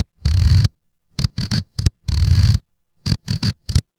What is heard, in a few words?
ui loading